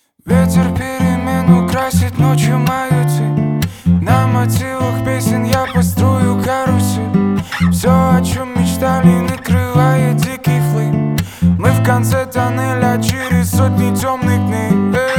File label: acoustic version